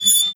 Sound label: move, hinges drawers; Half Life Alyx; tap